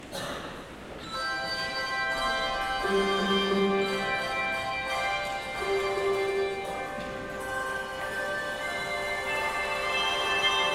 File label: Handbells